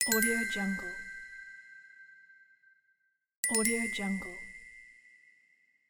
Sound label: new message